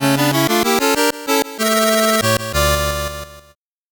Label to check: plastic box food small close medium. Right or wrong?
Wrong